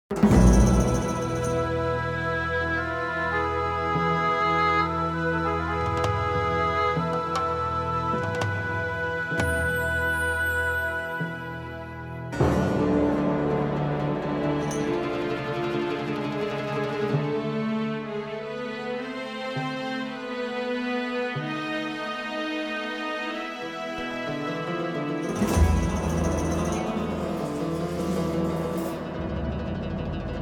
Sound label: Istanbul Ambient; music